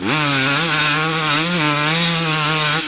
Power, Engine

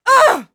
get hit voice; UFC Tapout

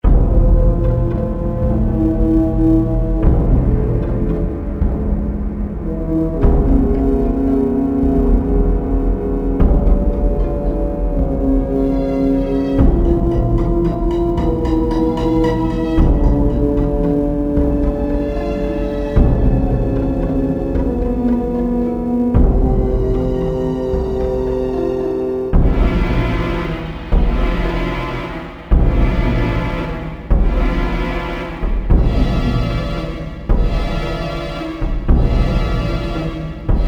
slow, music; Soldier Of Fortune II Double Helix; XBOX; kamchatka

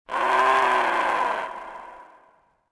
creature; ikopi; Star Wars Jedi Knight II Jedi Outcast; XBOX; ambience; yavin

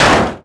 bouncy picnic chair hit; bouncy objects; Tony Hawk's Project 8; XBOX

